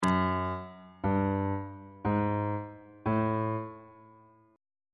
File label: g flat 2nd interval pitches on bass clef